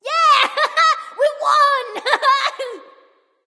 yay; cupid

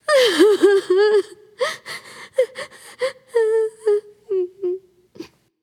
boo hoo; smite; voicepack; jingwei

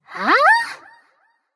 confused light; smite; voicepack; hel

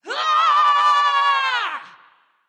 battlecry; smite; serqet

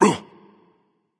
grunt jump; smite

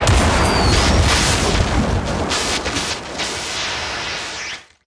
death lightning; smite; god merlin

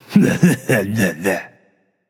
nv tongue; smite; voicepack; hachiman

